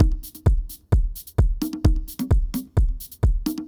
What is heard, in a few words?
jazzy 130